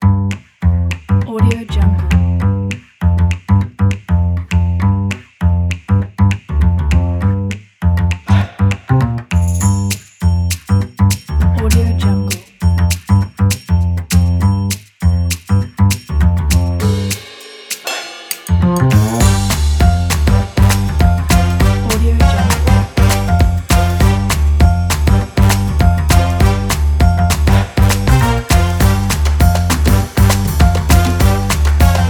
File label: fun quirky jazz